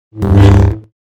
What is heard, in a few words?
saber hup